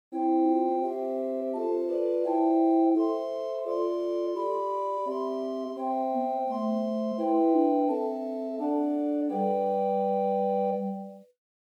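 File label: Great Spitzfloete 4; First Baptist Riverside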